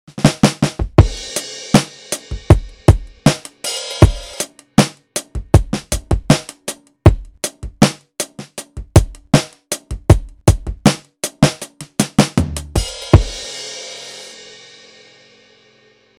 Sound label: Pop